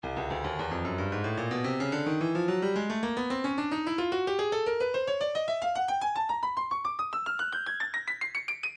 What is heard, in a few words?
breakbeat midi 110 bpm; chuffed a; Stylus Breakbeat Midi Files